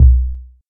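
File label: Low bass drum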